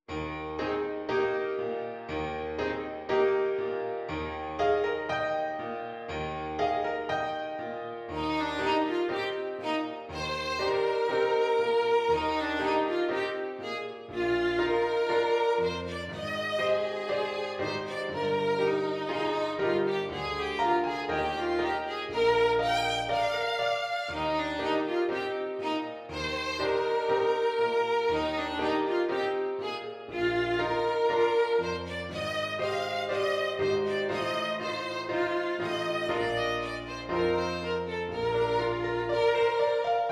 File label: Violin